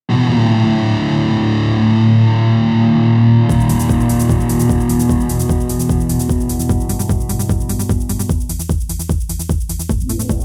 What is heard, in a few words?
Digi rock